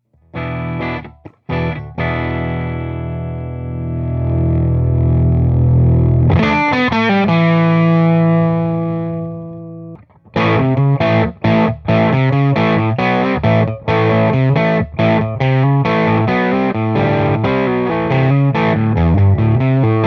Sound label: Gain Knob